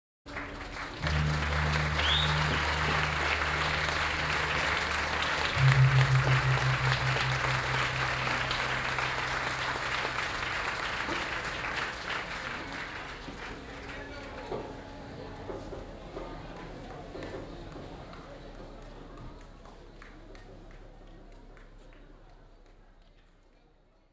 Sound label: Gamelan; Propeller Island - Complete Gamelan